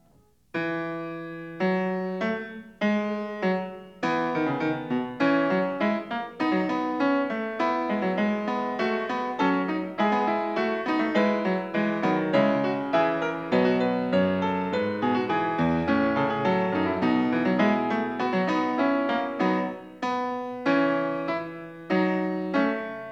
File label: Fugue No. 9 in E major, Bach, Well-Tempered Clavier; BWV878